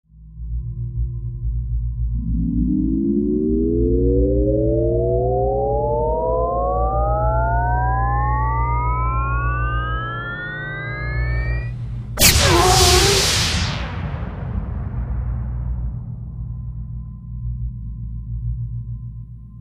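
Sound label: laser cannon charging shot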